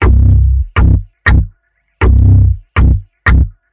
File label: 120 basic flubby bass